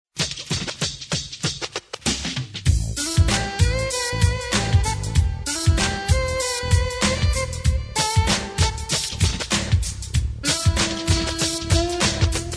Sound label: jazz pop